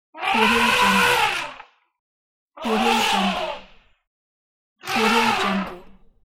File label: elephant